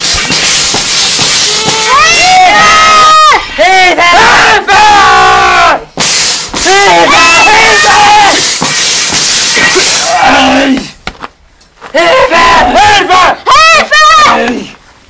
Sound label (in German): klopfen zischen Hilfeschrei; eigene Geräusche